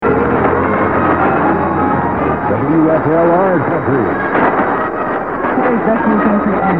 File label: 1570 Country, mw dxing; WFLR